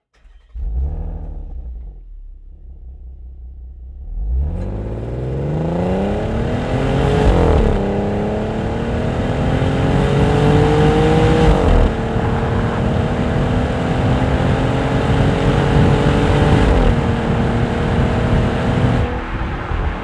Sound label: car accelerate exhaust porsche cayenne